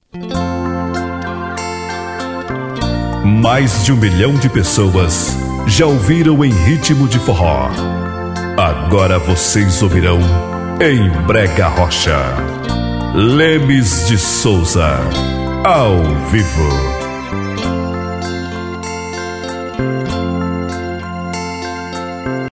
abertura

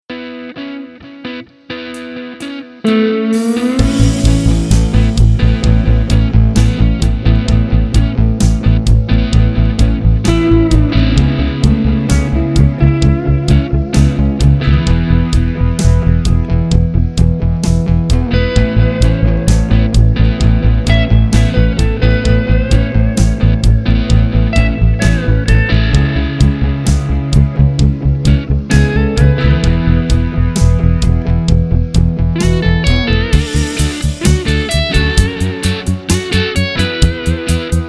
horizontes instrumental

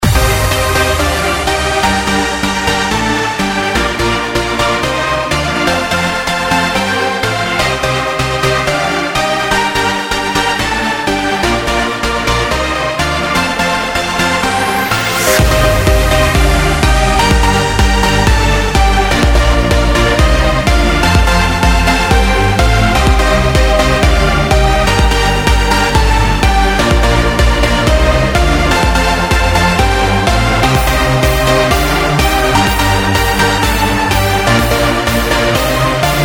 trilhas dance

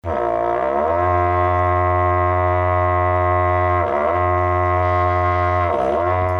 forrozao abertura

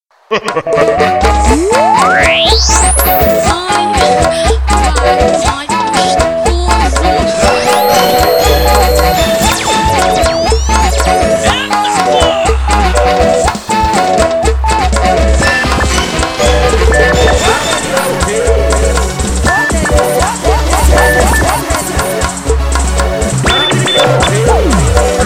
groove frenetico

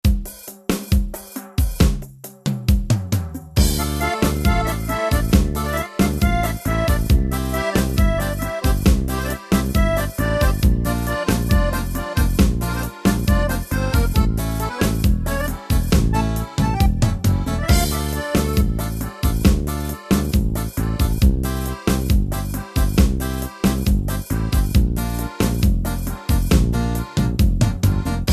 ritmos yamaha arrocha com dançante universitário

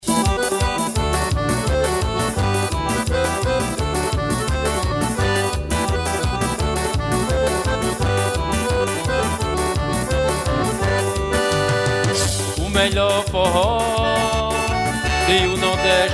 melhor forro do mundo

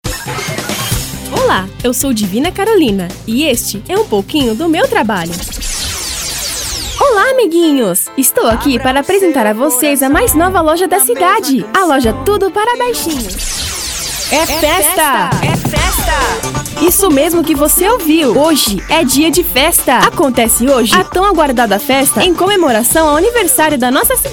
infantil

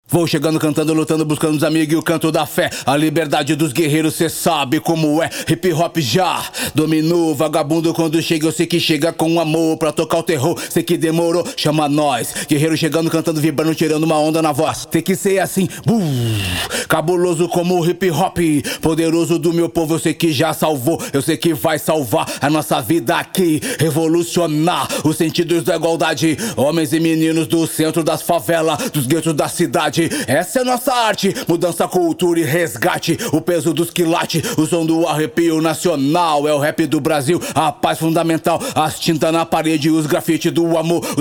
hip hop

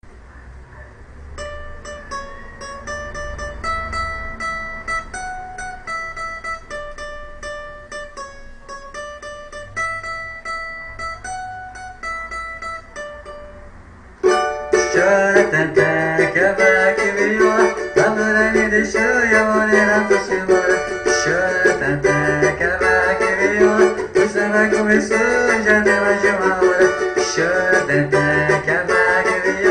chora tantan cavaco e viola

